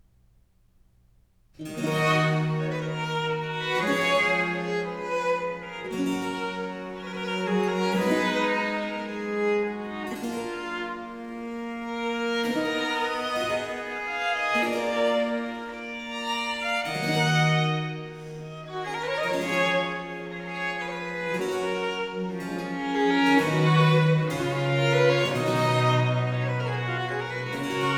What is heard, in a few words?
Ayres for the Violin, Suite in E Minor, Preludio semplice; Book 4; Adagio; Italian Baroque Violin Sonatas; Nicola Matteis; Ayres for the Violin, Vol.1; 尼古拉斯 · 麦克吉根